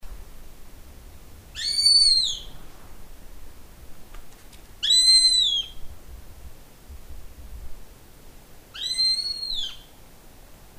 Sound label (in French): buse a gorge blanche